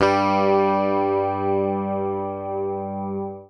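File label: bass, G2, left channel; PICK; Sampleheads; Will Lee Bass Library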